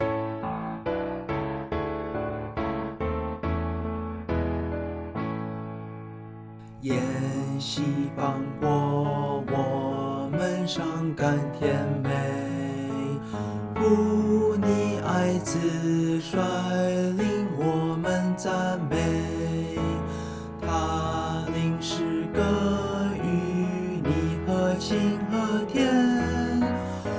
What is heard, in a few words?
vocal; Hymns; Chinese